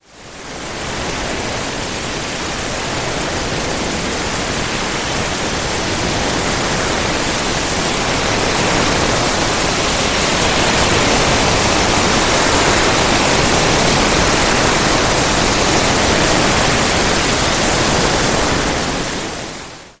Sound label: rushing river sfx; final fantasy vi; ff3; ff3us